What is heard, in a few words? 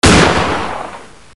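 shot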